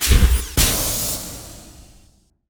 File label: drop object; allegiance